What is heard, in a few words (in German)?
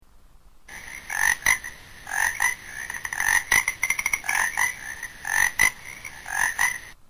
teichfrosch; siegendorf 2015